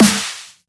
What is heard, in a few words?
Snare Sharp; Eryps